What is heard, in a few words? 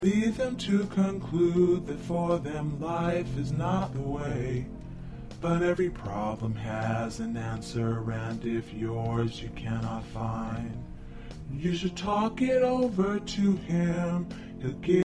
Bass